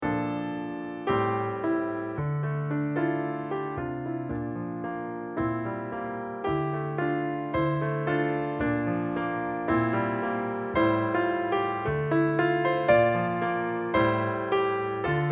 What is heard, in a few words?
accomp